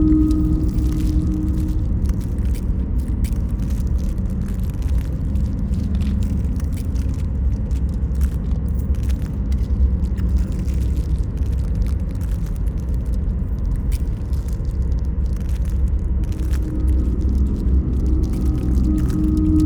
ship starbase idle; Stellaris; biogenesis

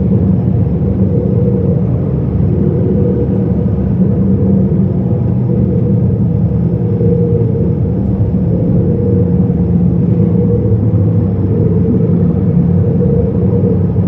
ambient battleship hum; Stellaris

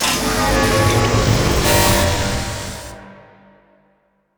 archeology event energy field; ancient relics

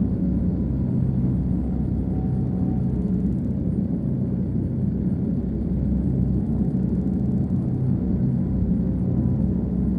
ship laser idle; ships; pre-FTL; Stellaris; first contact

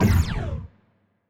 weapon laser muzzle; biogenesis; confuser

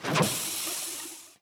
welding bot; ambient; Stellaris

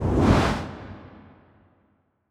kaiju hatchling tail swish high; biogenesis

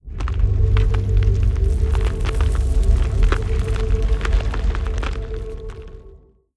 space crystals; Stellaris; nemesis

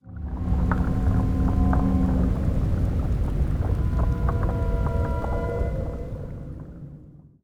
subglacial vibrations; Stellaris; extreme frontiers

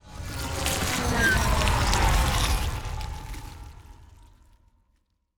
ant ship science move; biogenesis

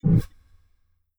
ui galaxy map open; Stellaris

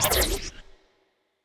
evasion buff projectile, weapons; Stellaris; biogenesis

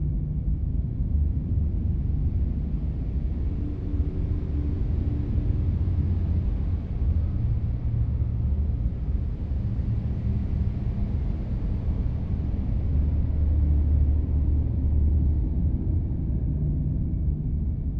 habitat idle; aquatics

